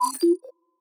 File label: Ui Notification goal complete Stereo; Robobeat